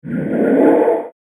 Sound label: Whoosh Laser Pulse; Whoosh Sound Effects; accent sfx for whoosh, wherever swish, sweep and swoop sounds are required; Andrew Fleming